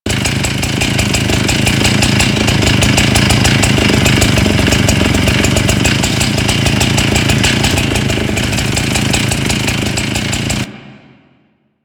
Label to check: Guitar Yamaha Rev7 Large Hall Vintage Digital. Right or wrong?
Wrong